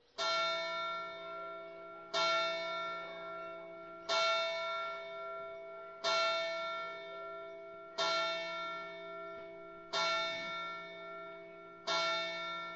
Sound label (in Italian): andreis, campane